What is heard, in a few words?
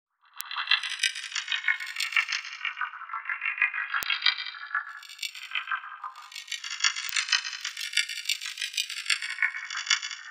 Tirks Plate; Sonic Foundry (Sony Creative Software) - Future Retro Dance Excursions